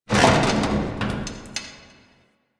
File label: Car Creak; Ambience; Props; Warframe; Nineteen Ninety Nine